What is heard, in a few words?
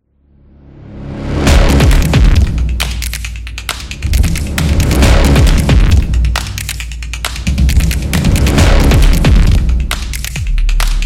The Stomps